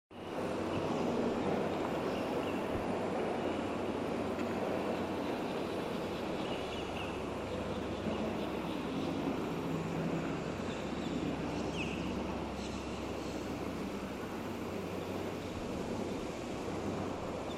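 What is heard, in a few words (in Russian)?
city park with rare birds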